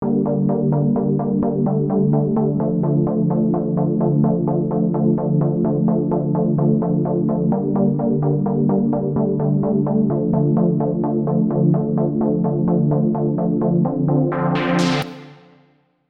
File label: Pad; PatchFactory